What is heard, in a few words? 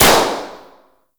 Balloon Pop; Warframe; Nineteen Ninety Nine